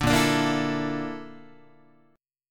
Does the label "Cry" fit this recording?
No